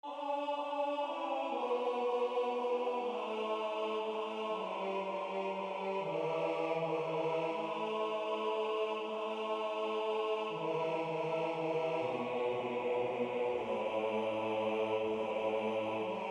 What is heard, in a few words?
Bass; Sut steilas